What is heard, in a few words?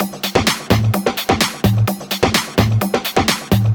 Minimal Loops